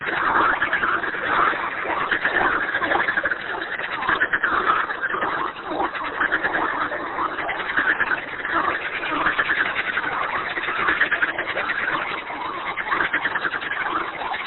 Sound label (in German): froesche; kunstradio